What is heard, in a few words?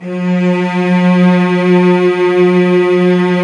STR LUSH S, MIDI Section